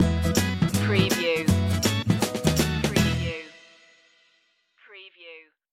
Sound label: game of jax stinger